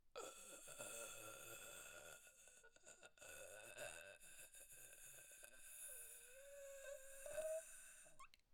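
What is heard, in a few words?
Human Struggled Breath Male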